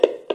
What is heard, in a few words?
hang up; phone guy